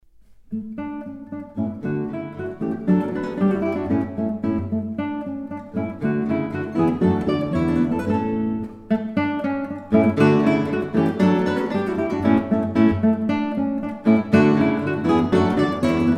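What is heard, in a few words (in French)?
Partita en ré Bourrée, 2 guitares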